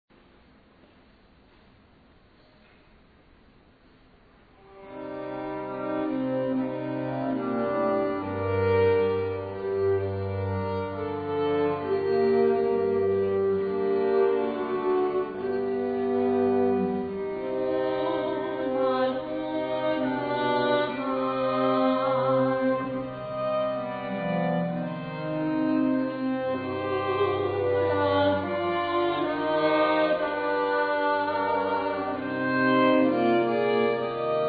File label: frog lullaby